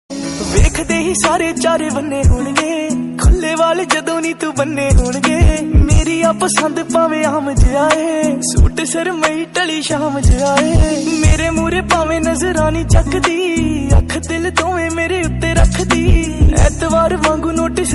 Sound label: remix ringtone bgm